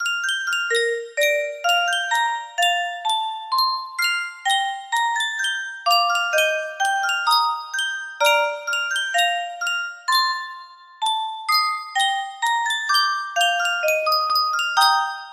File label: music box; yunsheng custom tune